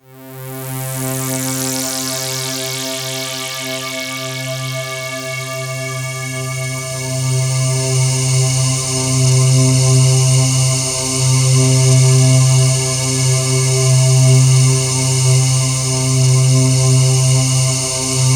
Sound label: SynthLine C3; 90 s Sample CDs; NiceBeats; SynthLine VOL-6